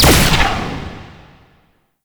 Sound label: Laser Explosion; Enemies; Weapons; Warframe; Tau; Sentient Dactolyst